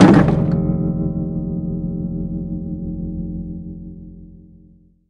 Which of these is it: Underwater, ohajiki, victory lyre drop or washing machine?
victory lyre drop